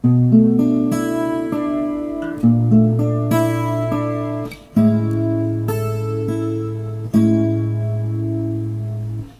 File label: 100 A4 Hm7 Gmaj